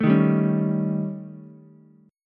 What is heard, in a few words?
D sharp 7th Flat 5th, D sharp, down, Ukulele; strummed; JGuitar